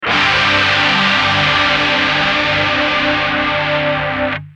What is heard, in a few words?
gtr mxr fd